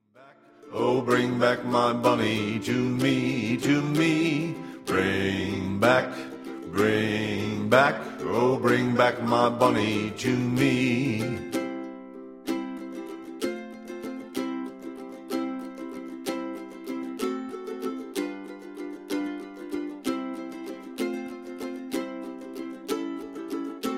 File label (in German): my bonnie is over the ocean ukulele gesang